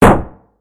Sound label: mini bomb; arms